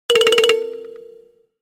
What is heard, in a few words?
Nokia C7